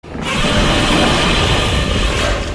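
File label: godzilla short roar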